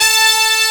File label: BRS; WORS STATION; ROCK